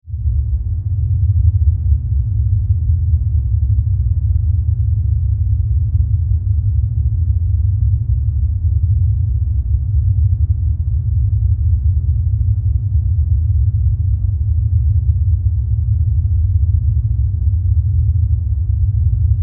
Spaceship Rumble, Large, Carrier, Craft, Science Fiction; Scifi; 5.1; BlastWave